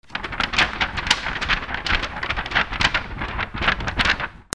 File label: PAPER